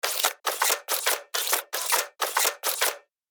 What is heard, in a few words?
Quadruped robot toy movement; rc toys